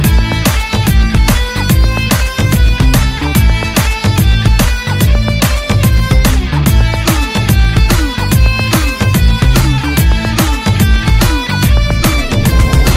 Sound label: BG Tone